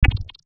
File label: Sci-fi Pulse Gun Blast